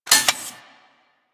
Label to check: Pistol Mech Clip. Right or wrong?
Right